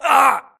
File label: grunt hits; Titanfall 2